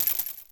Chain Swinging; New Super Mario Bros. Wii

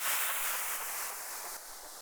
Ice Block Melt

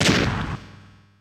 Boom; Super Smash Bros

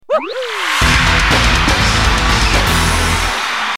battle outtro; Paper Mario 2 The Thousand Year Door; glitz pit

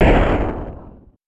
Beam Blast; Samus; Super Smash Bros

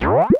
Coin Battle Swap Places

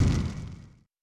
Small Fire Burnt; Super Smash Bros

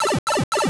pipe; super mario bros

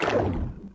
Lava

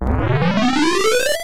erase; super mario paint

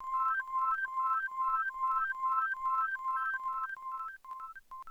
Princess Toadstool Fall

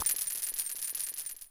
Chain Platform

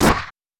Shockage; Super Smash Bros

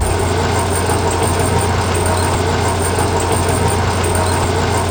Large Spike Pillar; New Super Mario Bros. Wii

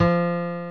music note; super mario paint

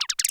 Attach; Micro Goomba

